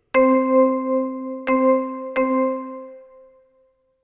ritme

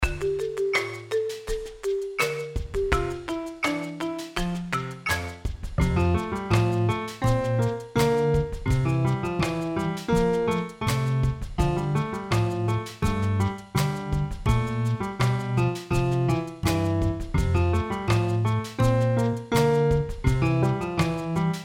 manneke van hout zonder zang

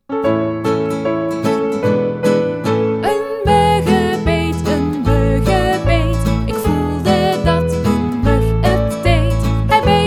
met zang

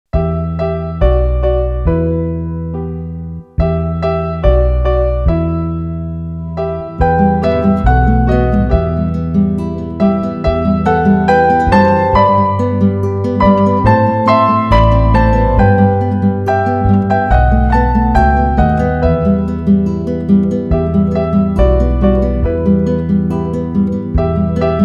zonder zang

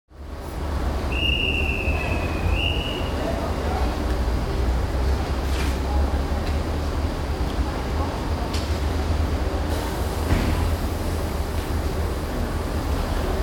omgevingsgeluid

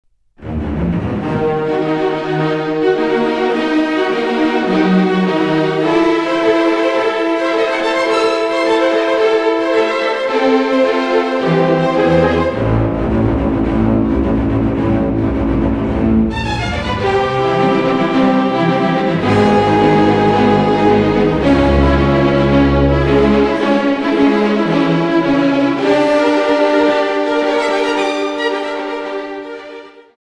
mineur of majeur

